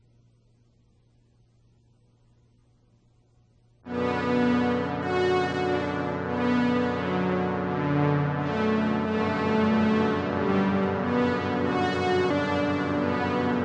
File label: Casio, P 600 mbira I in Bm Strings